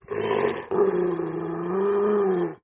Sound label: Dogs Large Dog Snarls